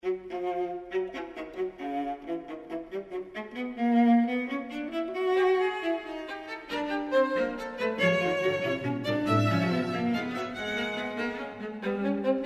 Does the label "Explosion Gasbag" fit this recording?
No